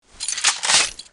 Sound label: shotgun reload spas 12